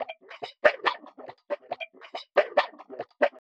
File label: ELECTRO EXTRA 140